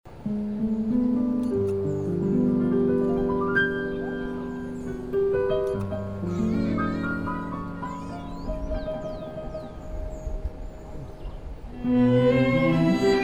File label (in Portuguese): Piano e Cordas